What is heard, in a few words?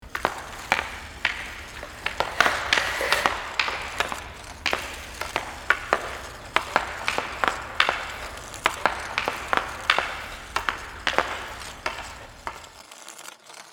Ice hockey stick puck handling